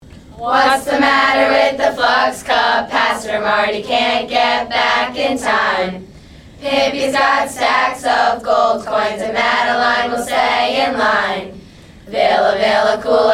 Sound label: Cheer